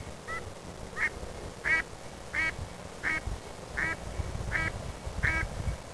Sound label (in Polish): kaczki mallard